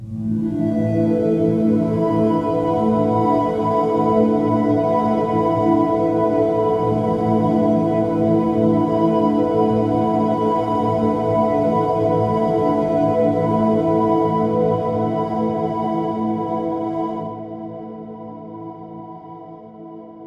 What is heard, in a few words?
nice pad a minor